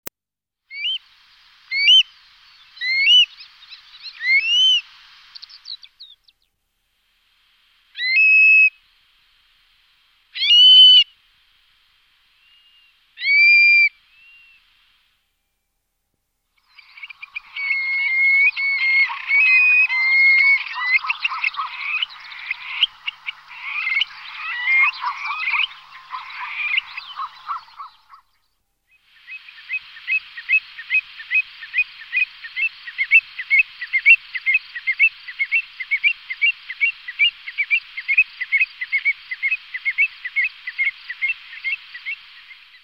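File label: avdotka golos, stone-curlew voice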